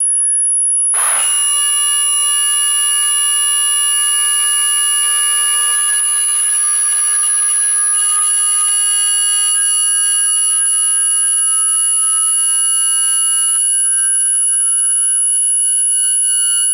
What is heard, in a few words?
high frequency feedback loop; sonniss gdc